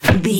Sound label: bullet impact wood light